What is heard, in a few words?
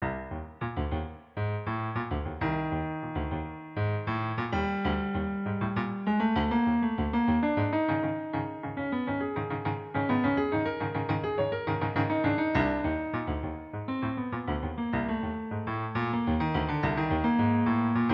Solo Piano Improvisations